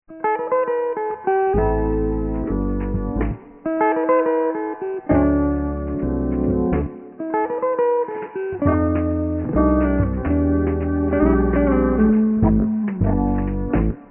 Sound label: hollowed body gibson